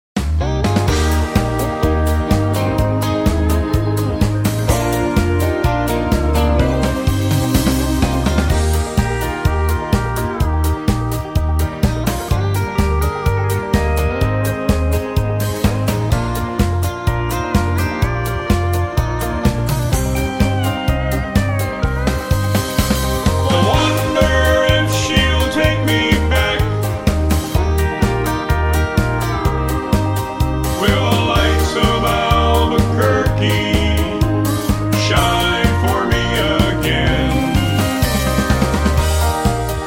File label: harmony